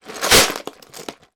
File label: toy lego box full movement; sound effects one